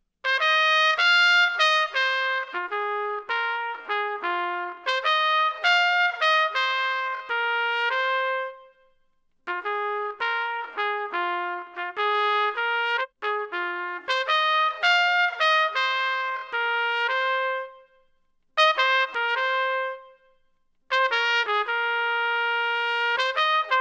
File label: trumpet, trumpet; Audio Analogies